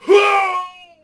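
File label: Swordsman Die Vox; Civ4 warlords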